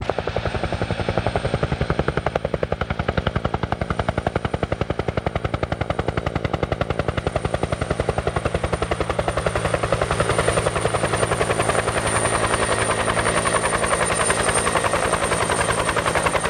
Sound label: Heli H212 Fly Past Mic, bell 212